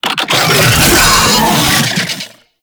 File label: Summon Vocal; Warframe; Uriel; Catenach